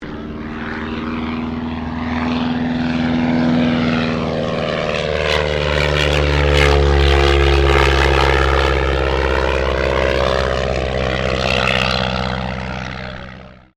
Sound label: AIRCRAFT DH82A DEHAVILAND TIGERMOTH PASS; RAAF Tigermoth; Airplanes (Sound Effects:Vehicles)